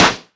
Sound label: Clicky Snare Drum D Key; snare drum samples